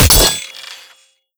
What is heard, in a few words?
manhack charge announce; npc; Half Life Alyx